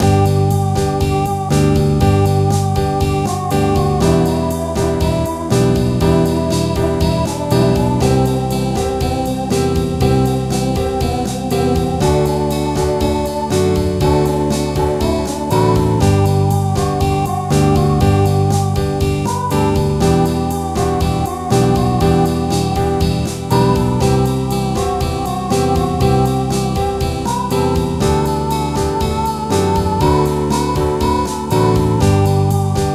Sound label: Bossa Nova